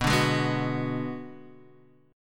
Akkord Bm6 boj

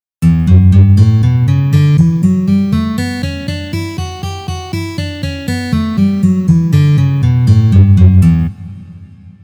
Gamma Si minor pozitsiya

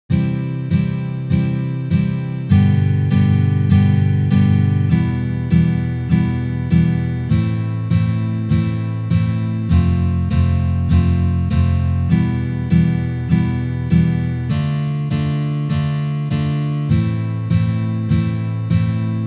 Uprazhneniya dlya igry akkorda Do mazhor na gitare

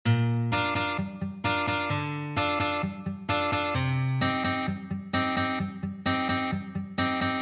Reggi

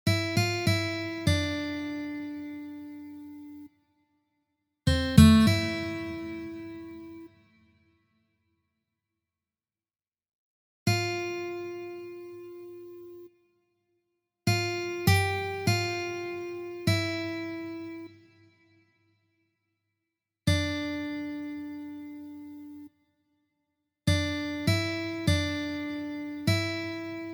melodiya

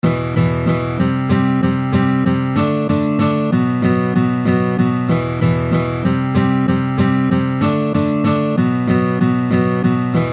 pripev

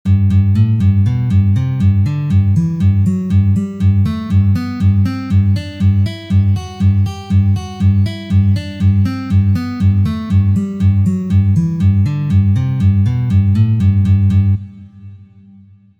Trenirovka na gitare oktavy

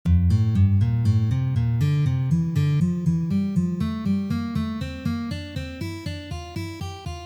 Uprazhnenie dlya igry gammy Fa mazhor

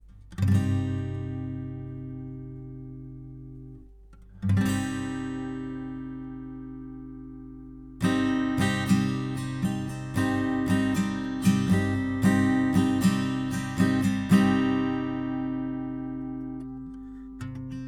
Akkord Cm 3 lad

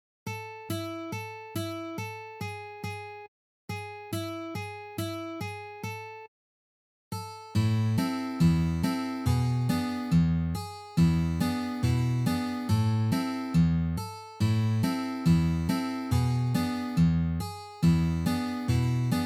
na gitare

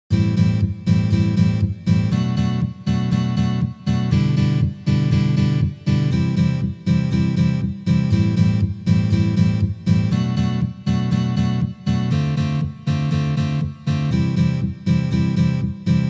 Uprazhneniya akkord Am7

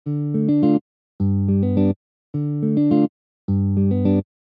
perebor

